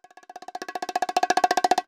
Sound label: BONGO ROLL; CUBA